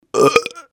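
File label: sound of burp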